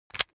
object household razor rickety fold up hard

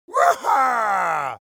human vocal male victory deep breathy

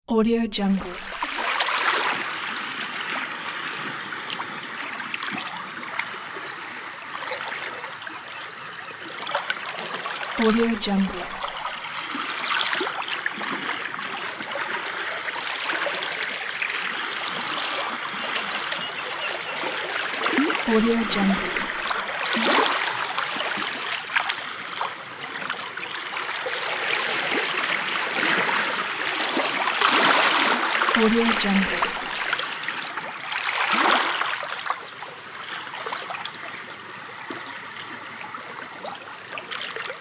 night sea

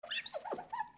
squeaky glass

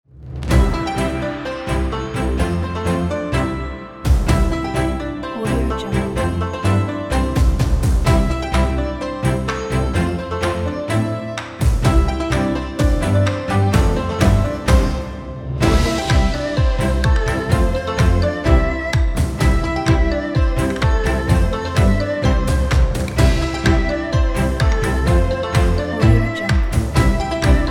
chinese festival

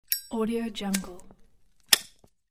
lighter

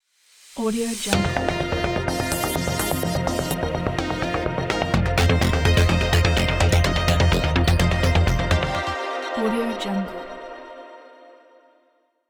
retro synth ident

